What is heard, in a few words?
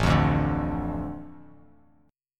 A Suspended 4th Sharp 5th, Bass Standard, Asus4#5 chord; Asus4#5 strummed; JGuitar